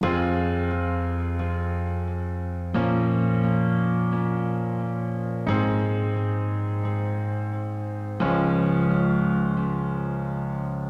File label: Taped 88BPM, Instrument Loops, Pianos; 99Sounds; Monosounds; Music Loops